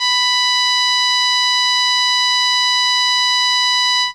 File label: Trumpet B5, ARP Solina Unmodulated; musicradar-synth-samples